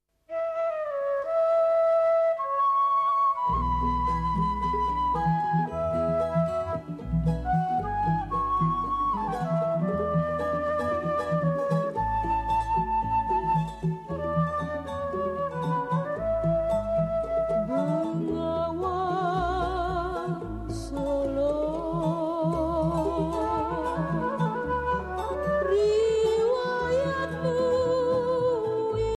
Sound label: Langgam Kroncong